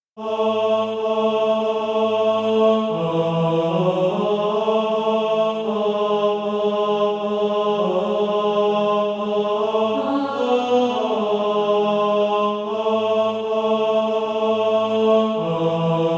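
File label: tenor, SATB Practice Music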